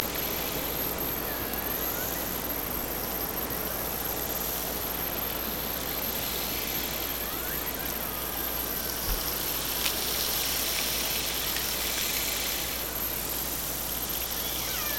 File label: Hydrophones, orcasound